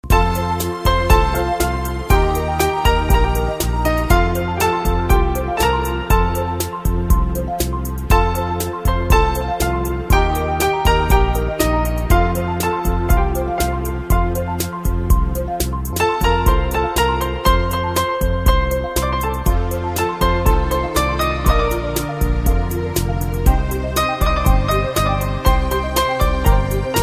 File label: Hindi Music Ringtone